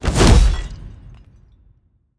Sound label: Slam Impact